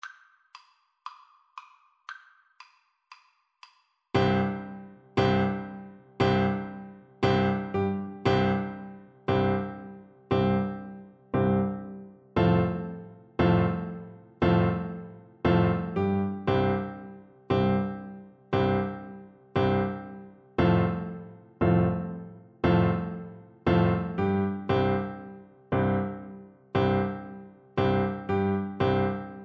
tchaikovsky 1812, oboe

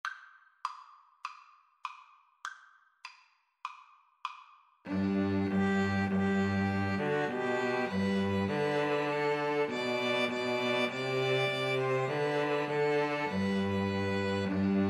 hark, String trio

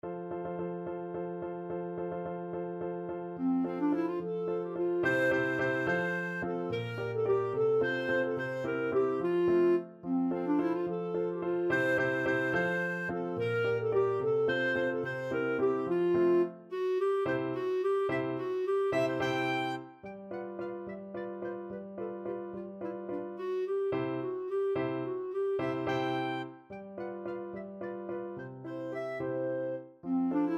jubelwalzer, clarinet

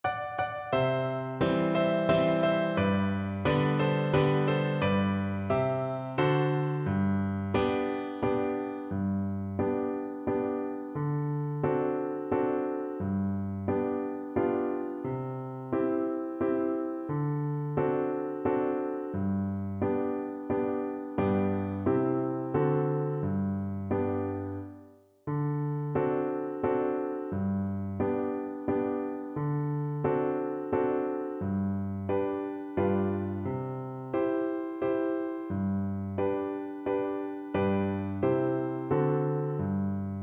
las mananitas, Viola

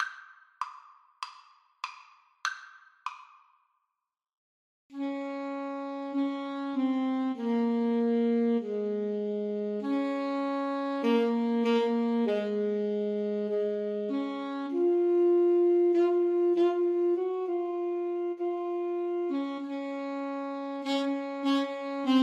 ASAX DUET; Alto Saxophone Duet

